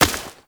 Step Dirt, Footsteps

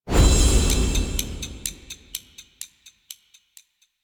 Minigame Timer Start; Duviri; Minigame UI

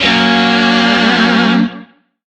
Shawzin Yo Chord F; Music; DE; Warframe; Lizzie Shawzin

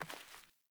Sandals Walk Vegetation, Footsteps

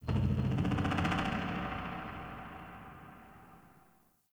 Ext Creature Distant Low; Ambience; Misc Outdoor; Warframe; Infested Eris

